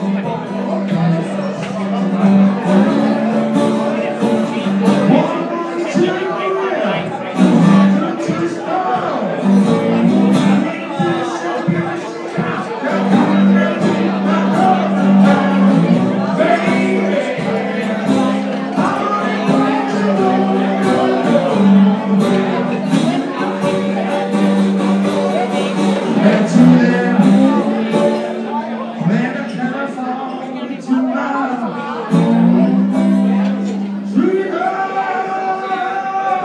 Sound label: 60 seconds of live blues bar ambience, glasgow; macsorley s bar